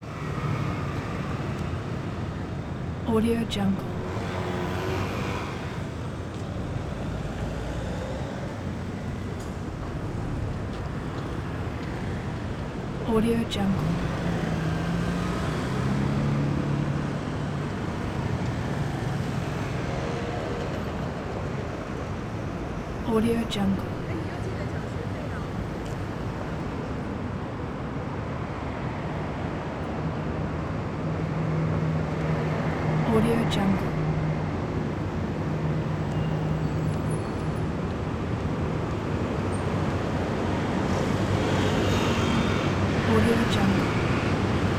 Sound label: taipei traffic rush hour busy buses